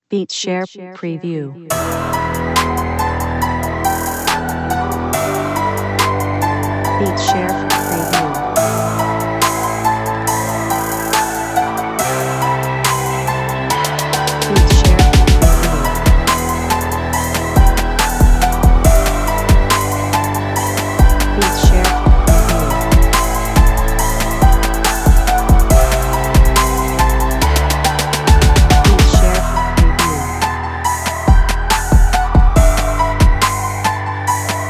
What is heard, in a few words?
Trap sample bpm140